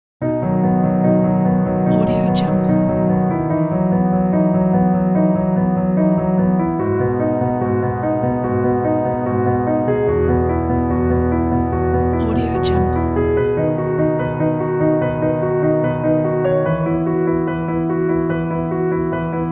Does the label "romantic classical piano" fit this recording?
Yes